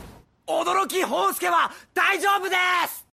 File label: scream; Apollo